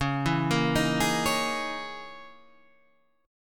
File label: Db Minor 6th Add 9th Db down Guitar Standard; arpeggiated; JGuitar